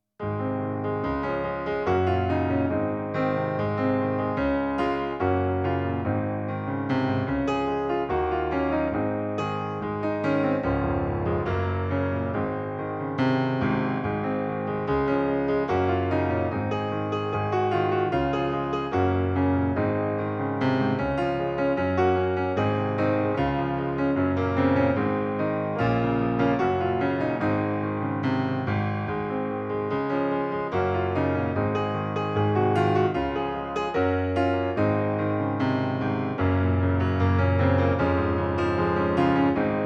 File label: Instrumental